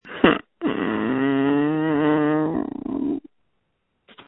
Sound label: screams